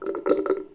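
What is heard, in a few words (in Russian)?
вода всасывание короткий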